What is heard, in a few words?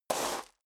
step sneaker gravel medium slide short; schritte